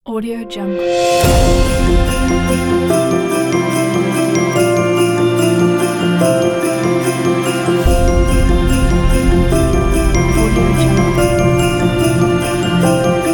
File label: christmas one day; legatopromusic